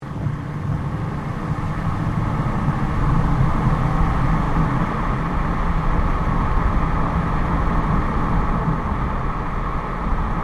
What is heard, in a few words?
Car tyre noise slow; Cars (Sound Effects:Vehicles)